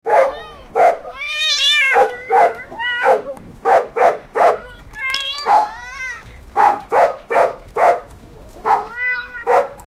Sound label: cat and dog fighting on